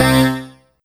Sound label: Synth Hit C; Trance; Synth Hits; Zero-G Trance Inducer; 90 s Sample CDs